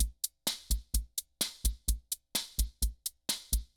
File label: Guild MG10C-1 Loop, Drum Machines; Guild MG10C-1 Sample Pack; 300 Drum Machines